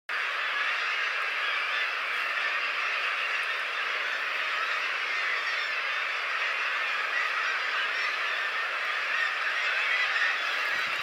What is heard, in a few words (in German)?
vogelstimmen gnalodden spitzbergen